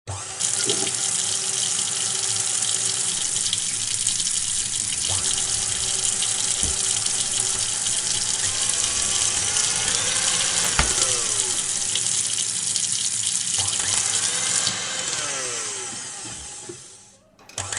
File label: home kitchen ambiance; Ambient; DailySounds